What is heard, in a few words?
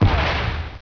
flak fire, weapon